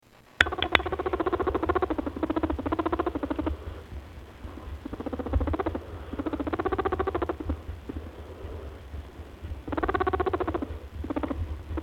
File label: purring, abyssinian guinea pig